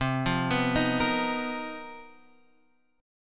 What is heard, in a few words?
B Major 7th Flat 5th C, chord shape; BM7b5/C; arpeggiated; JGuitar